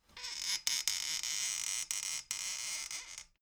folding chair squeak creak

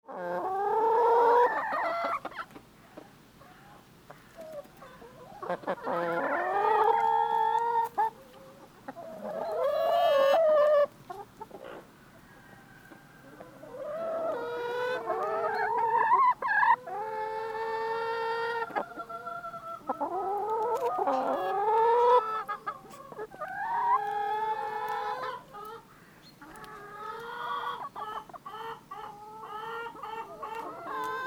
chicken clucking

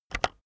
keyboard external enter press very fast

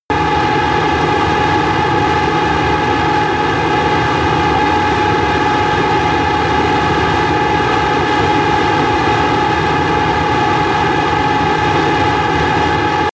military jet fighter steady noise

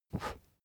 match blow out

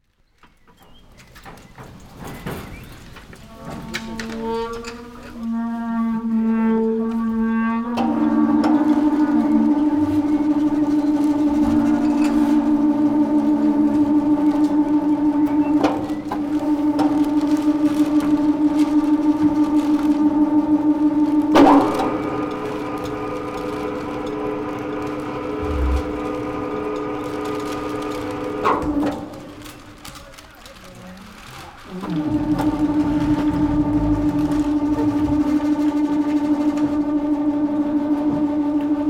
crane for lifting boats

